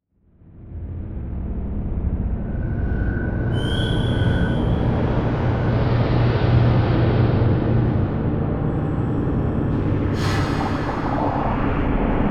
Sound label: Electronic Whoosh Reverse Feedback Digital; Adobe; Production Element; Imaging